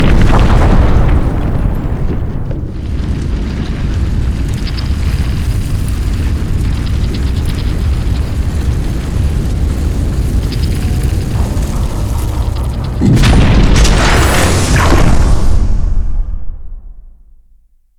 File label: stair extend; odin